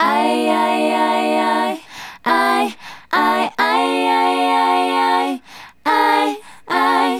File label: Female Group, Hip-Hop and RnB Vocals